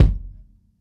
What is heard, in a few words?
Kick, drum